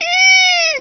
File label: squeeeeek; wanted